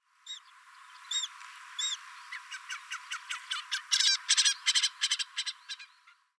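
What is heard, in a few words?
Saunders's Gull; hyogo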